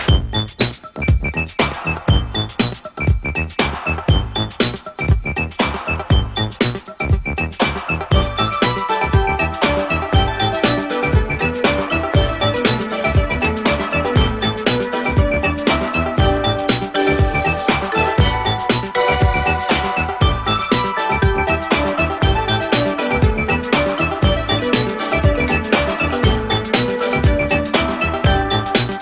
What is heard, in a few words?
COMPUTER GAMES SOUND GAME RINGTONE